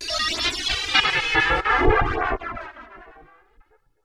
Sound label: AMBNT 160; Ambient